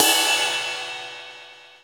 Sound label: ride edge; Greytsounds; Scott Peers Sound Engineering